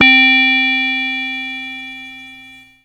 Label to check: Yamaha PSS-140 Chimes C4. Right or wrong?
Right